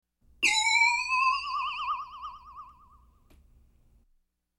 Science Fiction Elektronenfrequenz

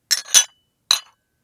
Tasse abstellen

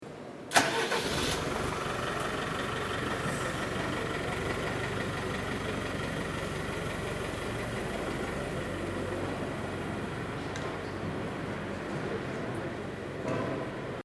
LKW in der Messehalle

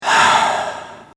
vox atem

